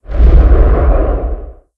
Walk; Perorozilla; blue archive

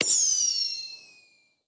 Fire Works; blue archive

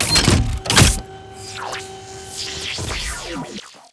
Heavy Tank Railgun; blue archive

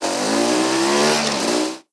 Vehicle Engine; blue archive

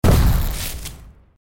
skill passive; chasers; bellona

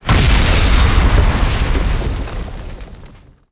Boom; blue archive

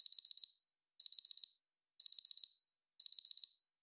Clock Alarm; blue archive

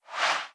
UI FX BG Scroll; blue archive

